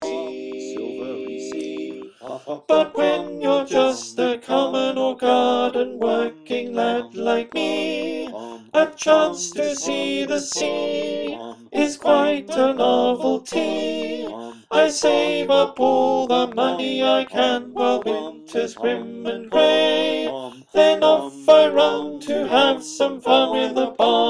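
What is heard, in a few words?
alto, choir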